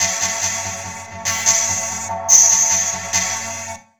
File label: Phased Guitar, Strings; Sonic Foundry (Sony Creative Software); Myths of Technology